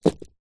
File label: technology headphones drop carpet